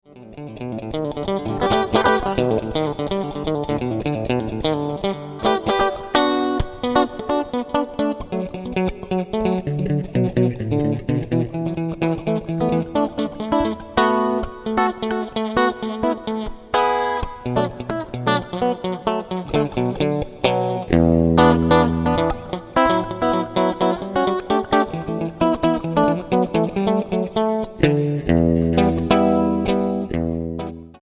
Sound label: swamp ditty